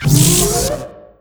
combined prism charge with other effects for intimidation factor; PRISMCHRG; tesla prism sounds